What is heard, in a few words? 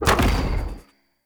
Blind Bow Shot; Powers; Fey; Saboteur